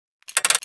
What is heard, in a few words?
repair loop